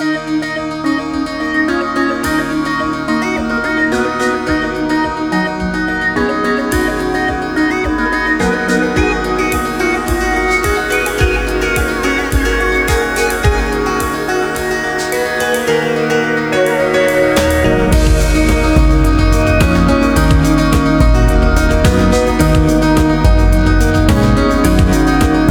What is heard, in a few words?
first run jingle; amarok